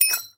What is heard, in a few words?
reload